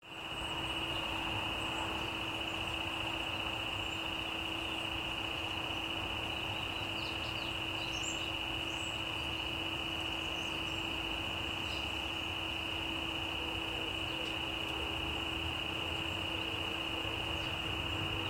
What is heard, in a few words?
tower drones; milton common; oxfordshire